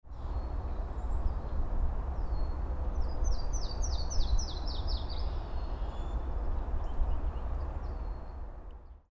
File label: Yellow-throated Warbler